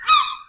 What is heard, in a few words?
Seagull; UltimateFishing